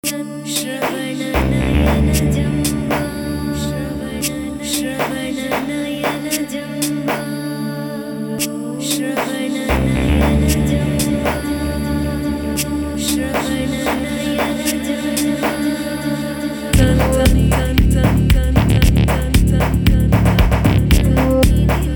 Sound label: shanti waves